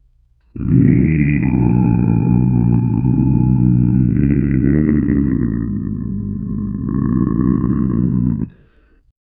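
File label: orc death growl deep guttural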